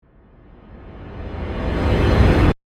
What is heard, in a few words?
Ramp Flash Gritty, Production, Element, Ramp, Flash, Gritty; Ascends; BlastWave